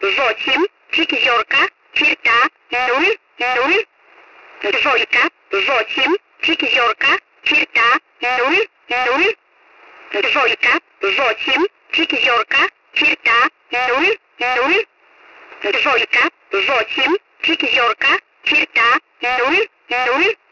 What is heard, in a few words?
usb